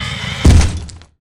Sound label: knight death; ubaid; Akeron